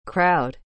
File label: Crowd